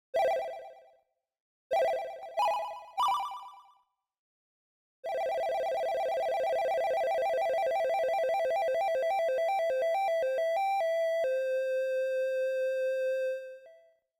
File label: C64 Wobbles; stay a while